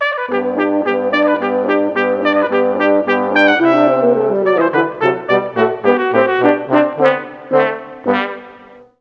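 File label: Hn Trp Tbn, horn trumpet trombone; Juilliard Music Adventure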